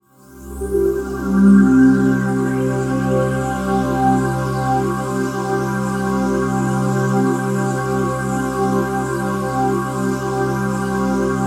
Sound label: ATMOPAD; Best Service; XX Large; Pads